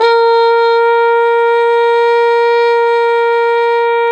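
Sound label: brass plunger trumpet, plunger mute; Roland L-CD702; Plunger & Wa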